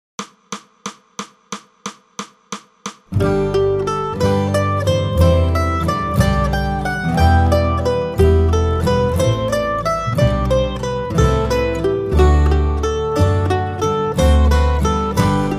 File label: Jesu Joy of Mans Desire Mandolin 180